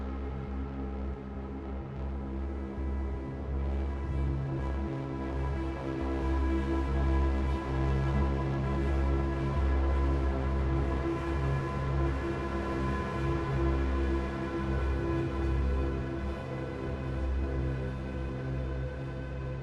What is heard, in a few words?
Waves Of Tension Loop; Arc Raiders